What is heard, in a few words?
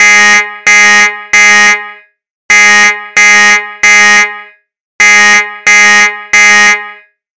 B type beeps